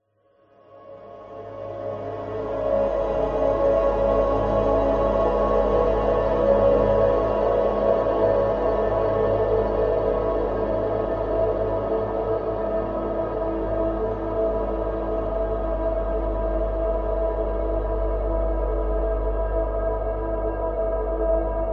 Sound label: horror drone whining airy desolate abandoned sinister